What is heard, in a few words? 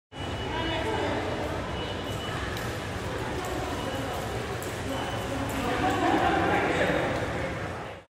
Museum environment